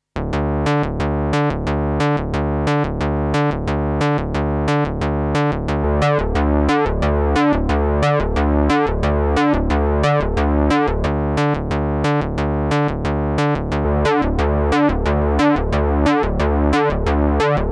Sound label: Juno60 Stereo Bassarpeggio; June60